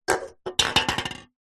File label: food drink beer can empty knock over table